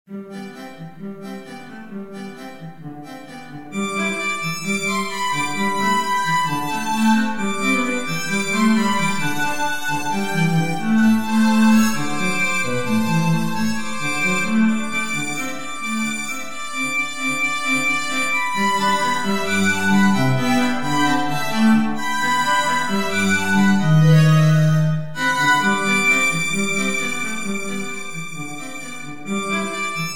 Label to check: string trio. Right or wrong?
Right